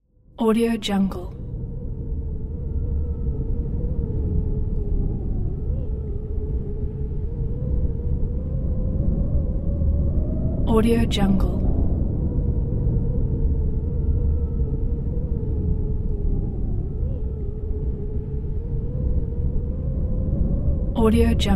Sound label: ominous windstorm